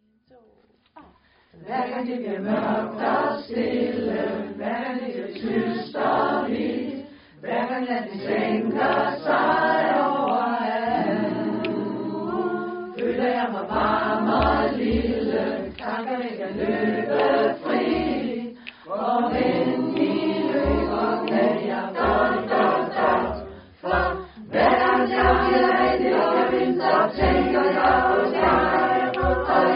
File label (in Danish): acapella